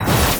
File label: Static; Blastwave